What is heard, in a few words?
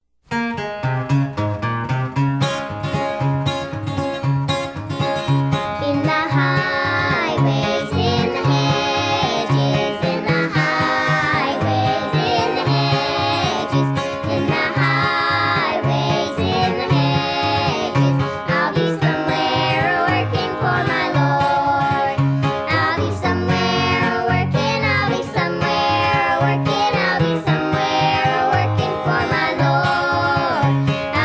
Instrumental; O Brother, Where Art Thou